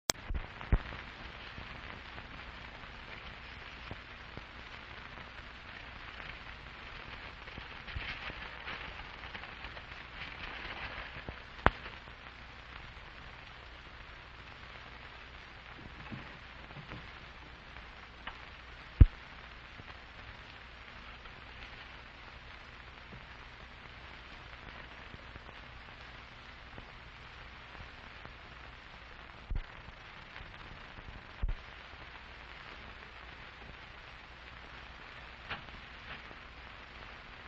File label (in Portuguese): banda ótica ruído